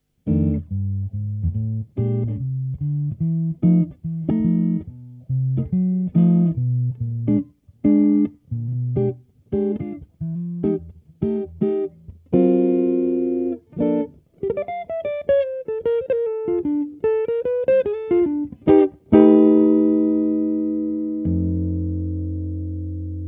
Jazzy Hals mit zurückgedrehtem Tonregler

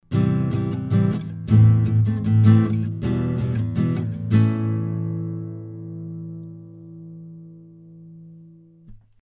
beyerdynamic FOX Gitarre 10 cm high

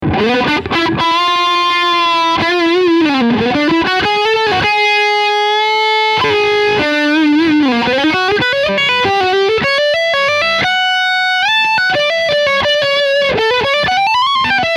Leadsound Steg HB

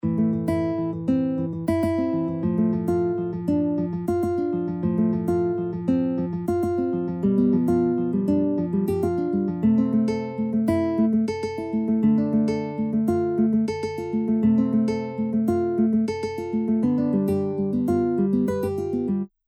Gitarre

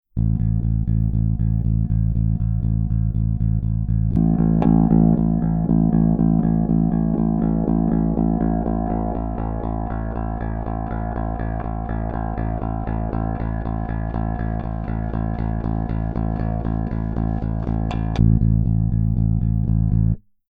EBS DRIVE 0 TONE variabel LEVEL voll CLEAN mitte

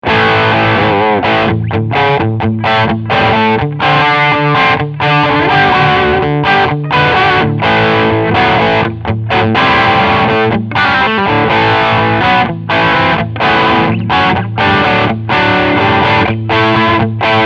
Crunchsound

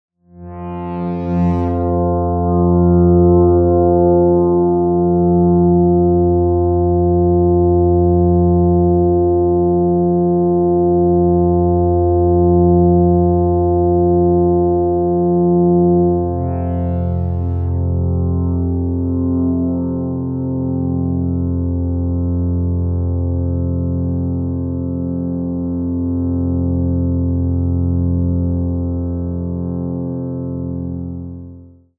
Ober Pad; Sound Particles SkyDust 3D

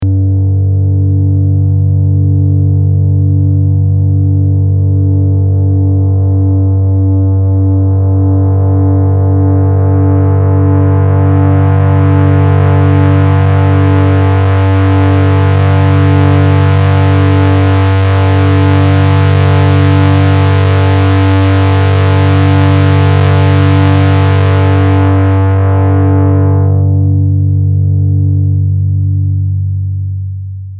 3VCO VCF kurz

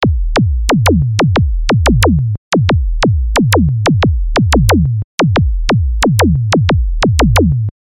filter knack

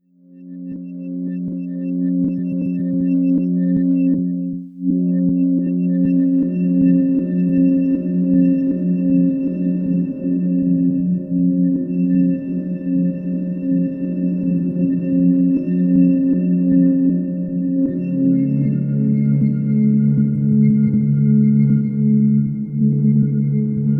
Sonicware Texture Lab Filtered Pad Reverb Ein Aus

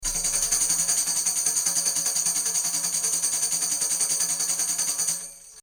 Tambourin 50cm 90Grad; Neumann U89; UFX

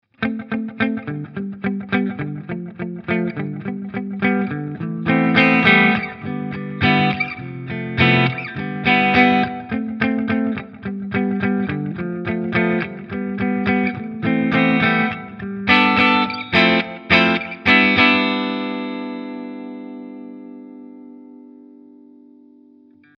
Maybach JazPole 63 Clean Mitte

